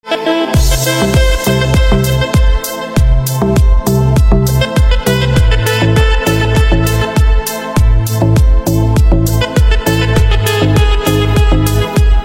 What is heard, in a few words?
Saxophone Instrumental Ringtone